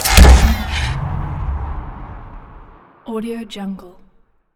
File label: rocket launcher; soundmorph